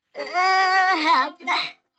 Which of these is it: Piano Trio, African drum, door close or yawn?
yawn